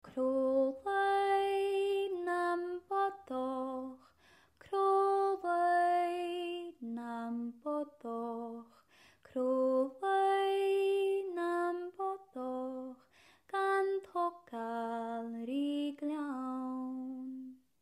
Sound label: chorus; crodh laoidh